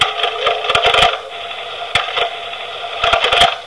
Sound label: vat think, ambience; caged